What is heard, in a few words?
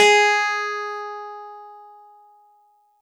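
Easy Clav DX100 G#1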